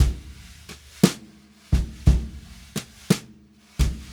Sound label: Brushes SN SN